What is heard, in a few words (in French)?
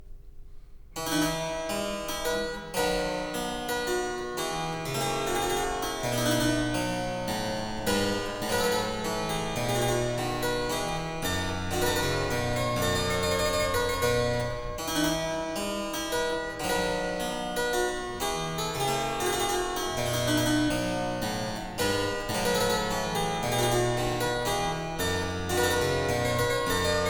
Suite en fa majeur - Sarabande; Greg Chant; '... pour passer la mélancolie'